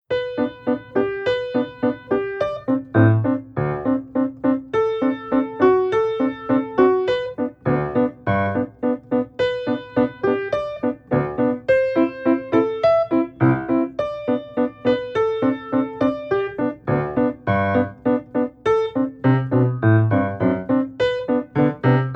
Overkruisen met rechterhand, piano oefeningen

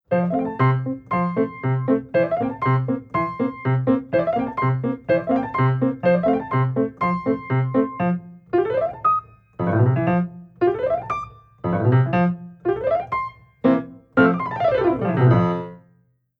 De glissando, Piano

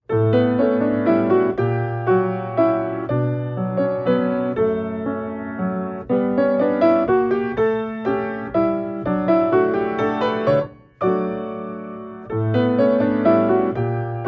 octaventraining, Piano oefeningen; Schumanns octaventraining

